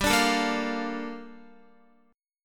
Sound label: G Minor 9th Guitar; Gm9; strummed; x,10,14,14,10,13